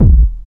puffy tr 808 synth kick drum a sharp key, tr 808 analog synth drum kick